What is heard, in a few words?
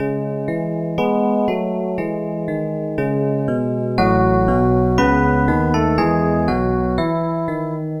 loop